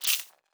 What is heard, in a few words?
Spark, Electric